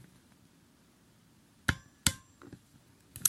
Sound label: torque wrench